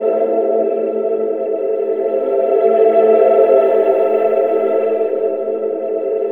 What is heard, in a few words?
CHAKR CHOIR, Twisted Textures; East West - Twisted Textures-BT CD3